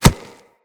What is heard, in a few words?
Kubrow Step Dirt, Footsteps